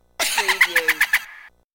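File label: Little Devil Laugh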